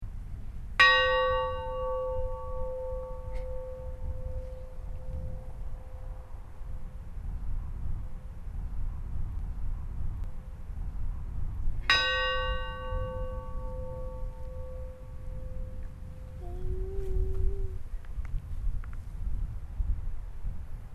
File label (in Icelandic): gufudalskirkja likhringing, kirkjuklukkur